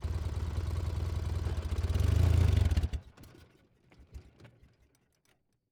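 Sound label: Dnepr M72 Exterior Idle Short Off; Ural Dnepr M-72 1944; ORTF; MKH8040; Pole Position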